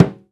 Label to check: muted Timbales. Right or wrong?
Right